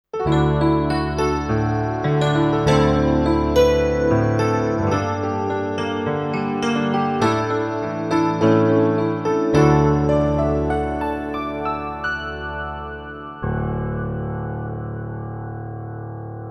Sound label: CFX Preset Piano; Montage; S6 Foster Piano